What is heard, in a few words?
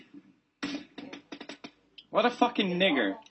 munch; pewdiepie pubg; Memes